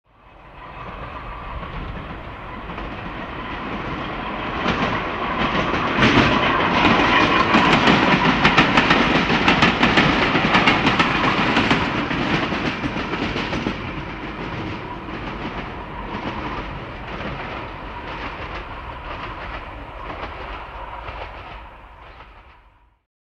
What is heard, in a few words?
Electric Train Medium By